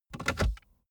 car bmw e46 interior drink holder insert bottle soft